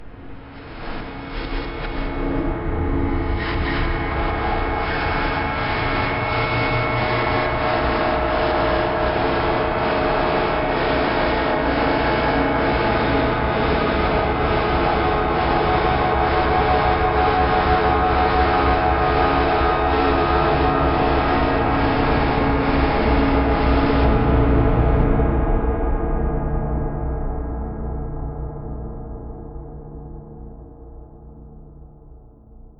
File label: Intense Reverse Reverb Ambient Track